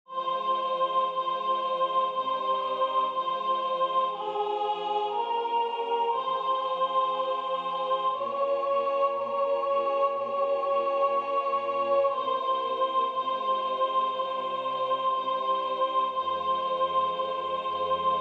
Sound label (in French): soprane; Hoc est Corpus meum